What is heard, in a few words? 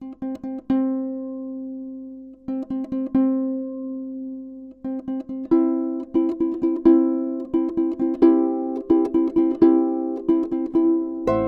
wedding march